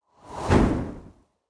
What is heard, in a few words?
npc oracle strike